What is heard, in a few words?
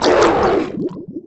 drown; quake2; fuddbeta